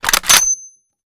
sn rechamber; gmod; iw8; alpha50

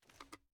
inspect; gmod

